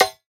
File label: Perc; Percussion